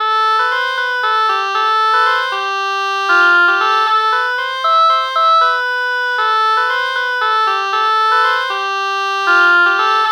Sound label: oboe oboe lpc false melody morph